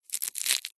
food cereal cornflakes handful squeeze crush

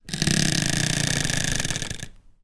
household chair seat creak squeak

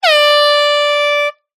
sport air horn 1x blast; zapsplat

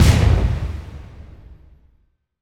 Impact Boom Crash; bottlerocket

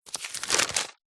household magazine cheap page turn

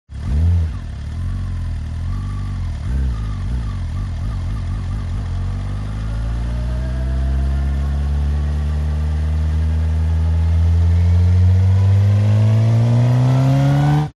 MOTORCYCLE Sport Bike Idle Set Off; TRANSPORTATION

